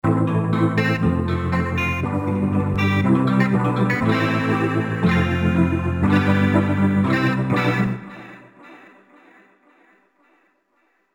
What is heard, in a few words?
Portal Guitar Loop Dry